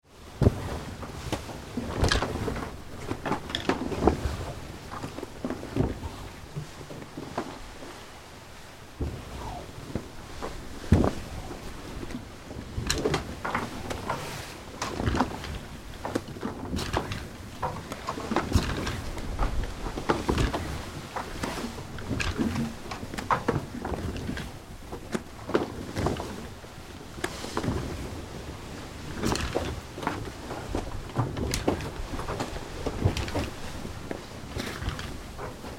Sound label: Sail and Rope Moves, Flogging sails various moves; Boats; Vehicles; Tom Hutchings